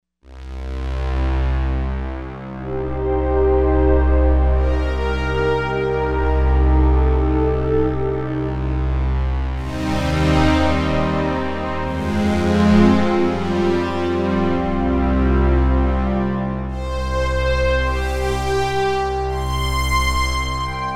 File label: PWM Strings